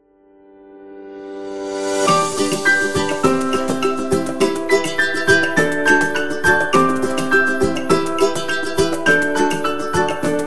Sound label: The Folk, happy music